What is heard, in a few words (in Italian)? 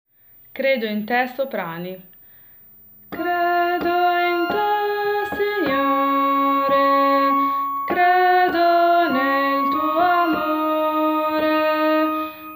Soprani